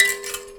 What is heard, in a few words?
Finger Hit, Prepared Kit; Battery 3 Sample Kits